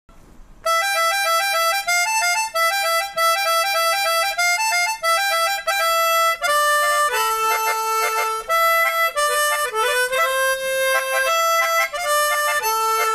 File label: Harmonica song